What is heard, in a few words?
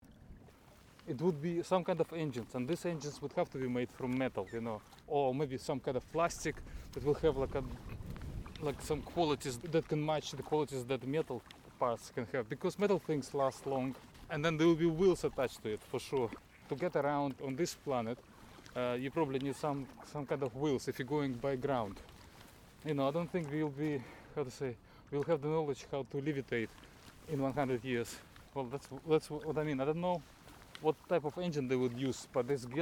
engine with wheels; kayaklibre; 2011 London